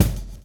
GRV, Groove; AMG Gota Yashiki Groove Activator

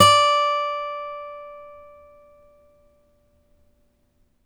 STEEL P D4, Synclavier, GUITARS; Synclavier Sampler Library

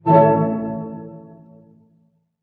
HARP HITS A MAJ, Orchestra; Sound On Sound - Peter Siedleczek's Orchestra

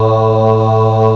CHOIR, HUMANS; M8; CMI Factory

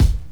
KIT, Dance Mega Drums, HIP; Best Service

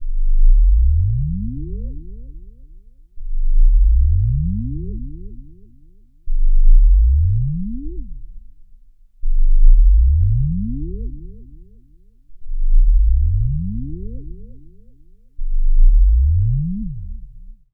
SUB UP, Analog Fantasies; USB Soundscan vol.49; AKAI

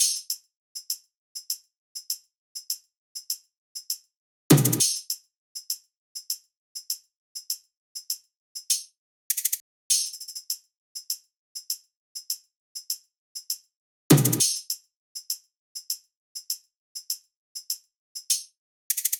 loop perc 100; Seismic Loops

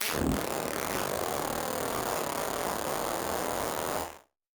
Battered Synth; malfunctioning synth samples

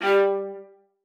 MART G3, VIOLA SPICC; Xsample VOL-5; 90 s Sample CDs

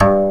GAYAGUM G 2, KOREAN

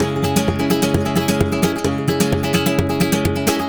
RUMBA, Spanish And Gypsy Traditions; Soundscan vol.59; AKAI

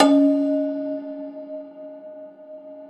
Rubbery Piano, prepared piano; Prepared Pianos; Total Piano; Rubbery Piano 2 +ped

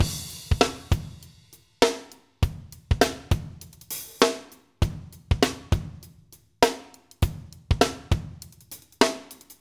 KIKIN; AMG - Now CD-ROM (Roland); Keith Le Blanc

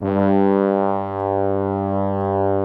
BRASS G1, ORCH BRASS; Greytsounds VOL-1

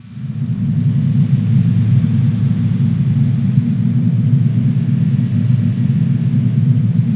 RUMBLE RAIN; Spectrasonic Distorted Reality 1

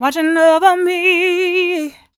f 126 mel f-sharp, fem Vocals

